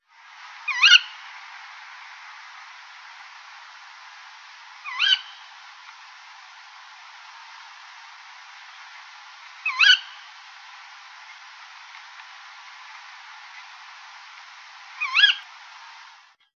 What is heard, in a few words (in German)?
waldkauzruf; hamburg norderstedt